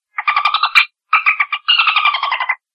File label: Dolphin sound